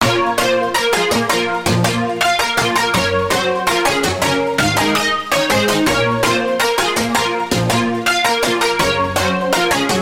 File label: Pluck; Peak Point; Routed Sound; Vital Expansion - Hyper Gamer Vol.1